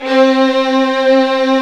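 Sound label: violins, fff; Roland - String Master Series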